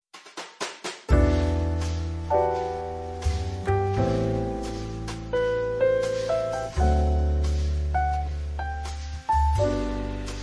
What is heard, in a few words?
Jazz background restaurant